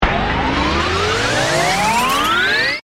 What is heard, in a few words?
Ascend Burst; BlastWave